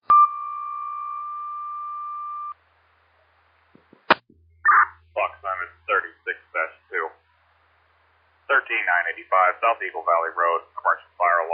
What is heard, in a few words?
page